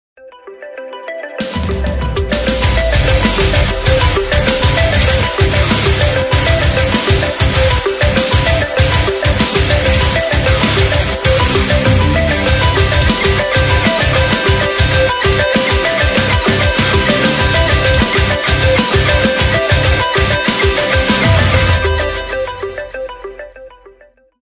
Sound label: ringtone metal remixes; ios 7